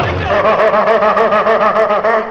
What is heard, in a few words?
breakdown, Beatbox; Drum loops